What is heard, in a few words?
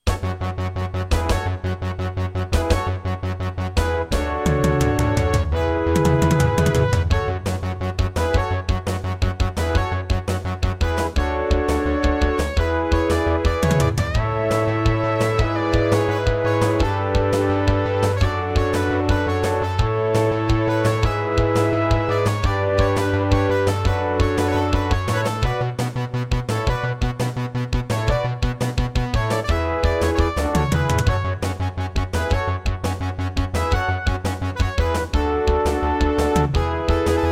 Intro, Roland SC-8820 MT32 Mode; 4D Sports Boxing